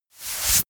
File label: wipe